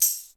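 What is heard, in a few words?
percussion, 60s kits; 90 sSampleCDs; Crypton - DrumBase5000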